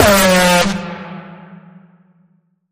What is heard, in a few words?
Bend The Alarm, F sharp